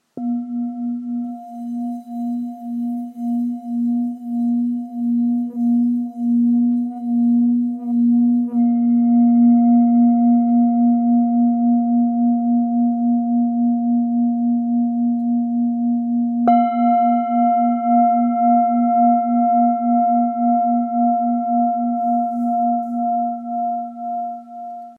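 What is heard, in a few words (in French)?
Full Moon la diese, bol chantant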